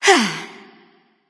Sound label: grunt jump; smite; isis